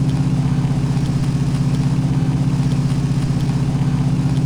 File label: car driv; Matt Hoffman's Pro BMX 2; XBOX